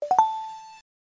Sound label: notification, point of sale; odoo